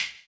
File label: CLP, CLAP; ILIO - Ethno Techno